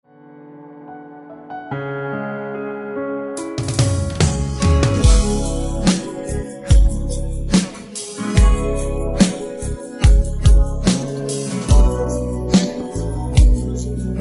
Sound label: beat, pop